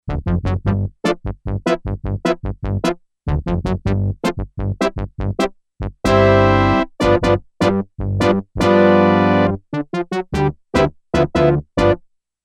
Brass

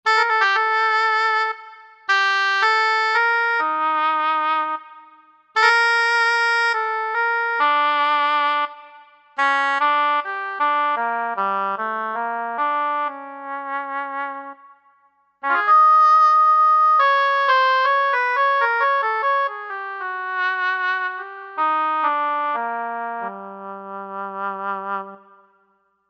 Oboe, Korg TR-Rack

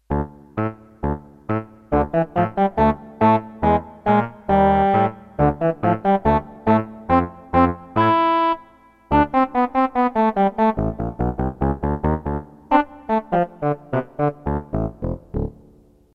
Bassoon Oboe, Roland D-10; Factory Patches

